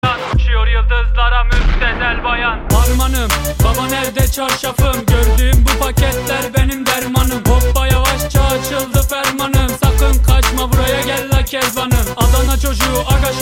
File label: song; meme